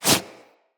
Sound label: Quick Foley; Characters